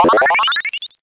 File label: game one up extra life